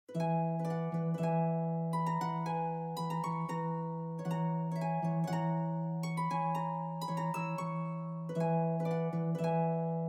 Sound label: Etude in Lydian b7, for harp duo